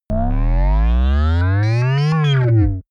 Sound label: power on control center break down